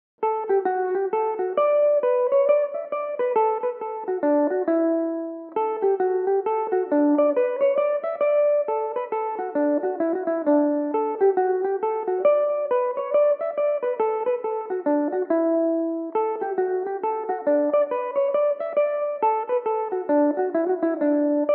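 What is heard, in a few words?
D major belmuse, irish mandolin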